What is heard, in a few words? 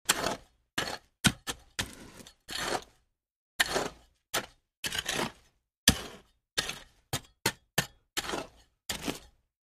Hoe Dirt, gardening